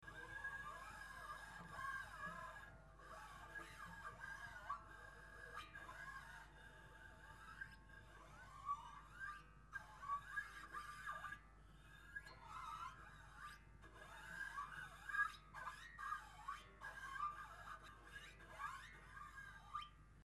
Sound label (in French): gliss, harpe